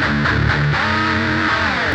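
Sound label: Guitar (metal); misc; Sonic Foundry (Sony Creative Software); Ugly Remnants VOL-2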